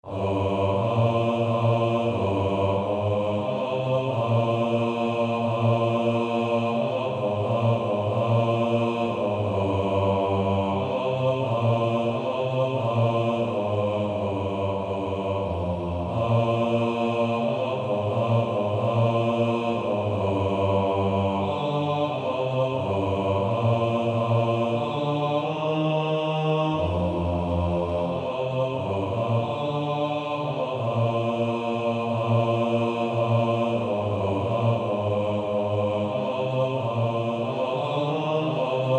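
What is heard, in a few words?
Male Choir bass 1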